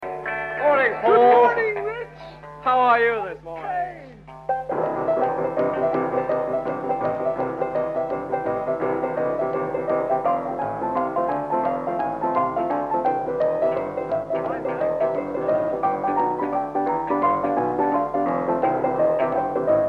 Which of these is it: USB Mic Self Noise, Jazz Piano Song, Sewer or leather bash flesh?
Jazz Piano Song